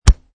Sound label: Chest Punch; Martial arts - chest punch - Variation 5; Punches and Hits; Combat; Michael Huang